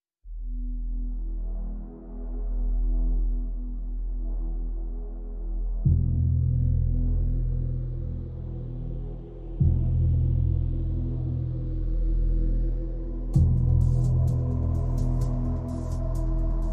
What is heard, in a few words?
No Perc